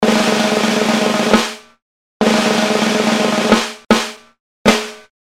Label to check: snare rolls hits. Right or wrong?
Right